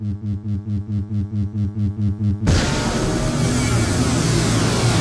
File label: warp start; Earth and Beyond; EA Games